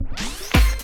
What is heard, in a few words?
SCRATCHES